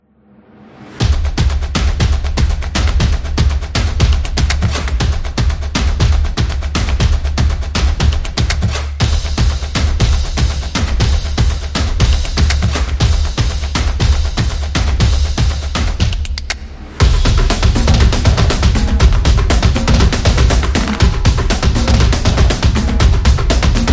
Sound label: Fitness Sport; football promo